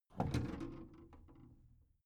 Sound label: big plastic case lid lift soft